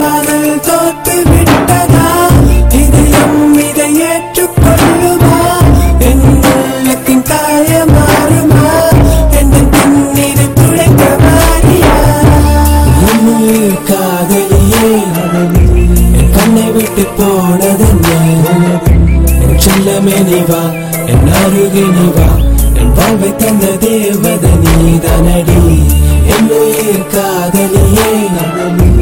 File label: Tamil Love Song